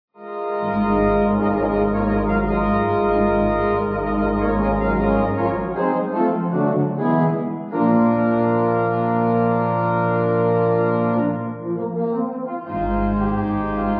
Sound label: Fanfare